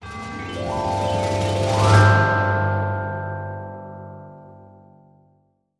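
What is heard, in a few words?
Christmas Wish Hi Crescendo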